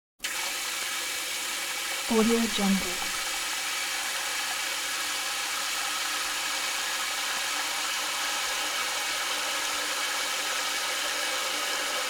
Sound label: faucet on and fill water